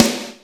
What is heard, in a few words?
Akai XR10 Snare; Drums